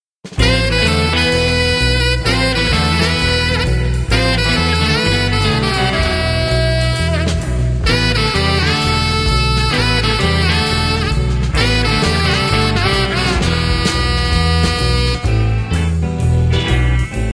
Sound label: sirena espanola instr; musicapaedia